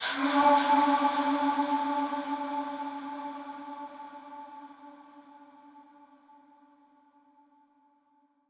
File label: 110bpm E, Vocalpack